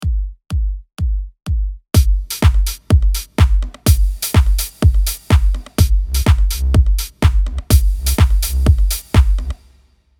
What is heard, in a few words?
house drums